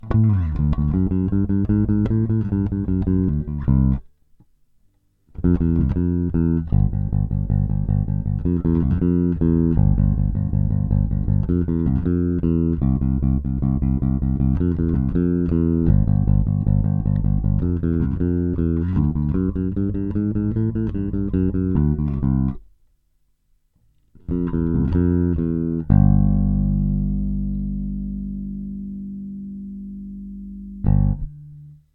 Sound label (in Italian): entrambi dita aperto